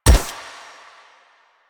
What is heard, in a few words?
Shot Mech; Warframe; Akarius Prime